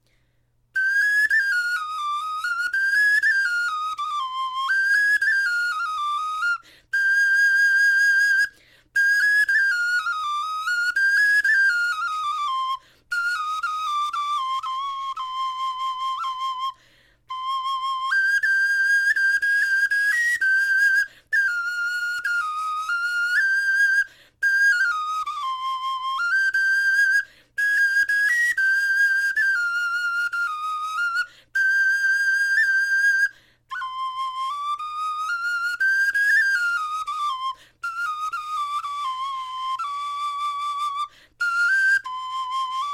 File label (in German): melodie, knochenfloeten